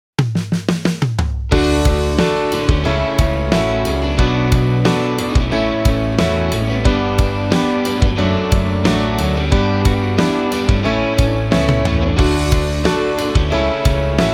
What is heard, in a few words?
BGM Graduation 90